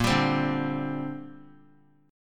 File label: A sharp 6th Add 9th; A#6add9 strummed; JGuitar